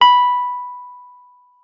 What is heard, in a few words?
WUFF B5, WURLITZER; Xsample VOL-1